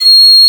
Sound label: Piccolo mf C6; Digital Sound Factory Virtuoso